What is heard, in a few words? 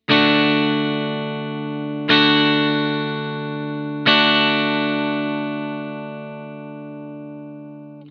chord d major, guitar